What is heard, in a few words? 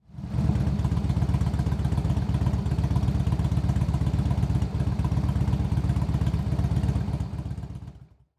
Transportation Motorcycle Harley Davidson Idling